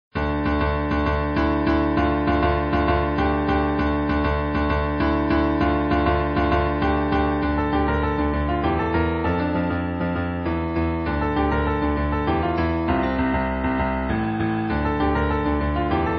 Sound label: fortepiano; ringtone